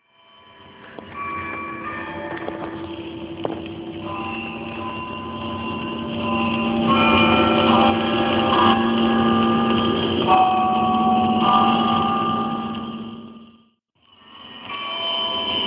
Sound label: Trinity Church s Gardner Chimes ringing in 1945